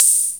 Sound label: Roland CR78, drums